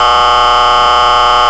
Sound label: new horn